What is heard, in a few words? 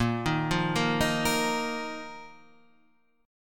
A sharp Suspended 4th Guitar Standard; arpeggiated; A#sus4; JGuitar